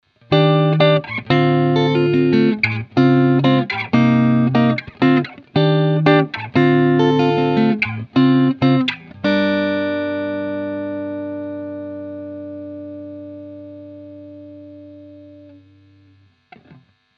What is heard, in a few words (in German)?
framus p90 clean hals; artist phil xg p90